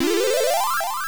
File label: Bonus Over; time cruise; turbografx 16